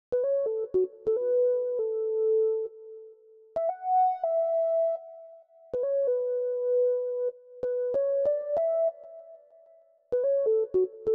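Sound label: 96 BPM B Minor Lead, West Coast; Cymatics; GHOST (Beta Pack); Forever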